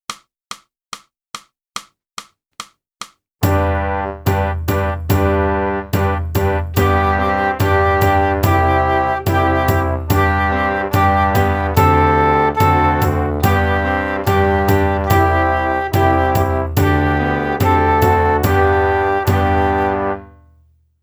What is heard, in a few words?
flute; boosey